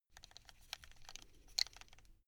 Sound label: buckle rattle quick